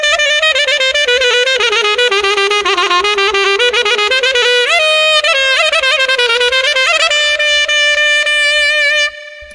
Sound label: Sax Improvisation